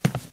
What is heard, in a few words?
footstep; slender